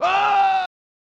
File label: Scream; Metal Morph; SNES